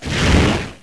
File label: Keyboard Up; ESAN VO Sound Schemes; ESAN Horror pack